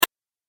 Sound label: Click Sound